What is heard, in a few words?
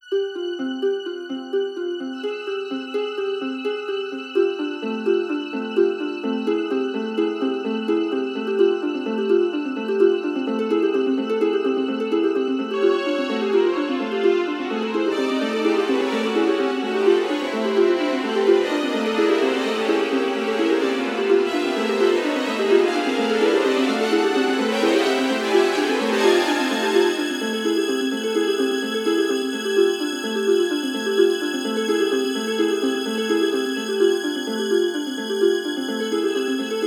Eerie